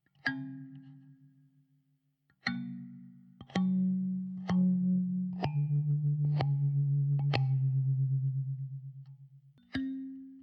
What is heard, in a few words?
test kalimba